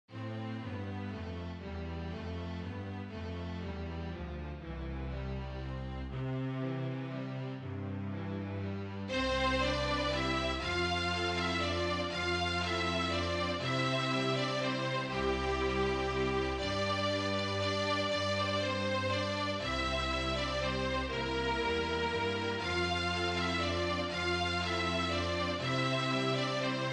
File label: Waltz